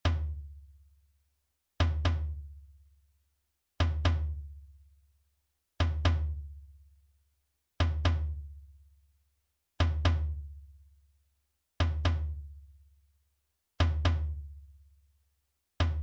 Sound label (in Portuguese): Toque - Surdo Medio; fanfarra